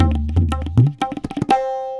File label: tabla 120